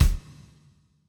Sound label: hall resonance kick